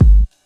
kickdrum sample g sharp key, bass drum sound tuned to the G# note